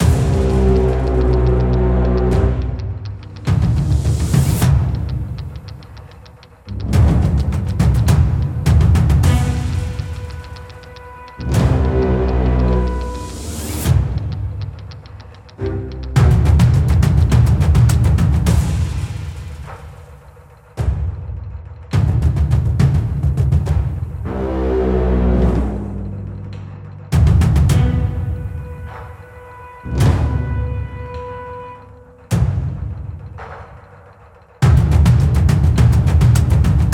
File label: combat normal II level 3